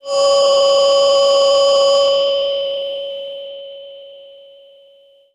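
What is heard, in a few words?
Breath V DX100 C#7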